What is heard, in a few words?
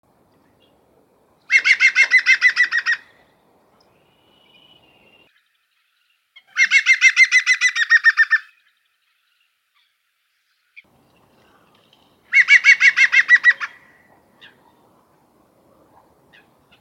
LE CHANT DU PIC VERT GREEN WOODPECKER SINGING